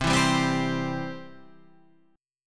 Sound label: C Major; C Chord {8 7 5 5 8 8} strummed